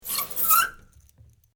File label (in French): Frotte table sec